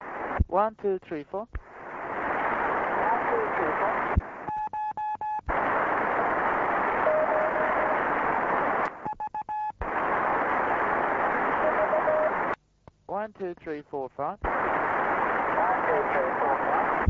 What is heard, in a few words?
ssb cw